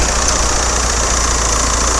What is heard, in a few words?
vw 16 valve, Vehicles